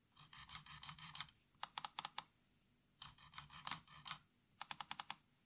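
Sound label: Scimitar Scroll, mouse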